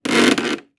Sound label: footstep wood creak